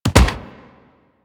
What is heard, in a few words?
Weapon Punch Bow; Warframe; Weapon Punch Layers